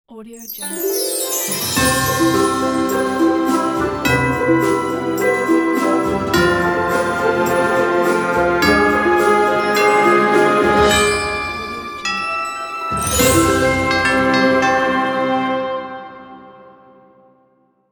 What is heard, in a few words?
Christmas Logo